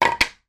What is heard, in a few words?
household beverage coaster wooden drop on table; sound effects one